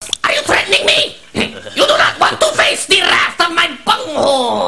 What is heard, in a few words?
Rababa